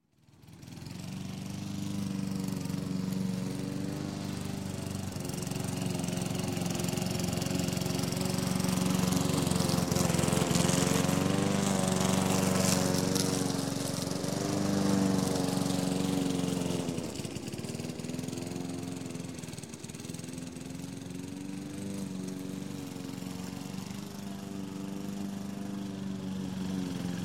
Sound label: Lawn Mower Gas Cutting, Stops, Gardening; Sound Ideas; Series 2000